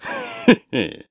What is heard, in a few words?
Heheh; Groose: "Heheh!"; Skyward Sword